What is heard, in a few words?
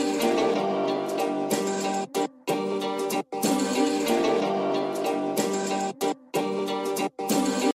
Dontownme instrument